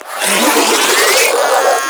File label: Spin Scream; Enemies; Abilities